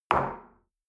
shell place on table hard; berufe; gastronomie; soundpool

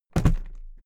briefcase plastic drop hallow; industrie wirtschaft; bueroarbeit

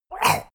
monster attack gurgle; koerpergeraeusche; comedy-cartoon; soundpool

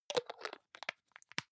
hygiene small screw cup close medium, badezimmer

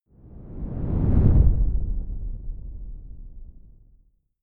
whoosh simple low long grainy; swooshes-swishes

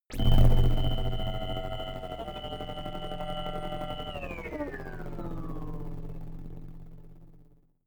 engine shut down glitch; energie

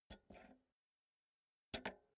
pull out jack multi socket slow; objekte; elektronik

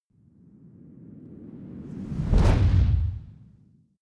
fire whoosh synth big flame; swooshes-swishes

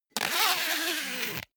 zipper pullover jacket close; objekte; reissverschluss

